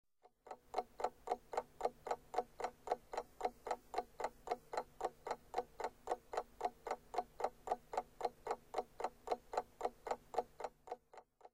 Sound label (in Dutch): geluiden